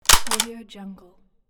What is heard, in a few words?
stamp